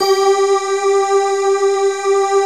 D50 Stak, Roland

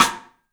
MARFSN, 3D Drums, X STICK; 3D Sound

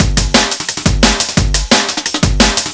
HEAVY SLAP BEATS, breakcore breaks

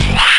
Yamaha Tg33, Drums

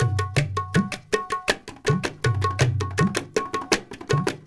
INDIA R, IND ENS PH, Heart of Asia; Spectrasonics - Heart of Asia CD2

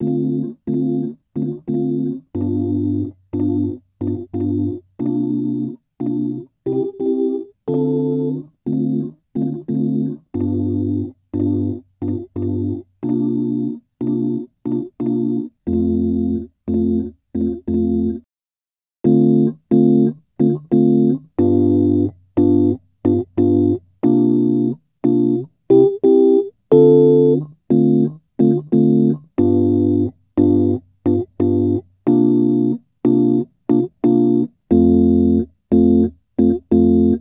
Rhodes Attacks; Ueberschall

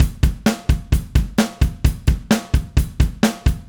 Drums, Indie Rock; Sonic Foundry (Sony Creative Software); Indie Rock Anthems; Session 10 - Roswell

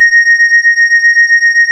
Synclav FM, WIND, A#; Big Fish Audio

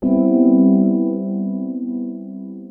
D&B Keys; neuro

